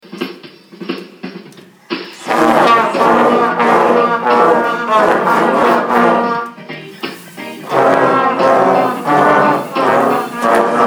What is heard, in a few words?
Reggae Reggae Year 7, Amazing rhythmic control